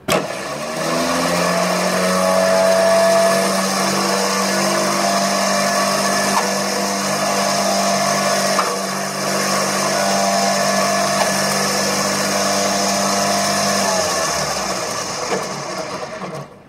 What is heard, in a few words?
Heli hoist; Helicopters